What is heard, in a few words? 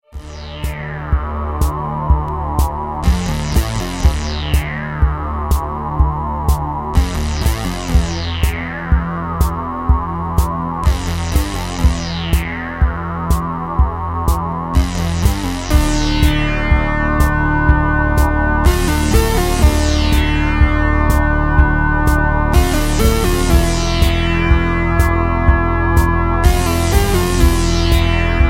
rhythm